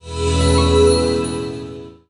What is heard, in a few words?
Level Up; Live Realm